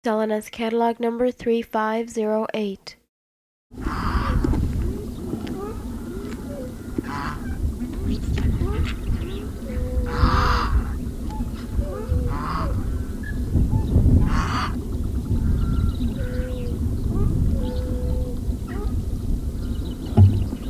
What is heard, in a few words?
swan goose